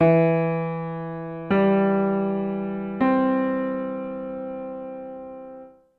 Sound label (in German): dur arp